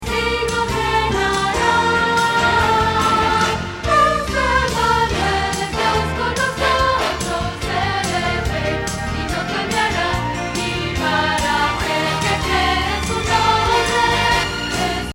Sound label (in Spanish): Contralto